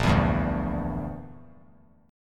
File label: Bb 6th Flat 5th Bb; Bb6b5 strummed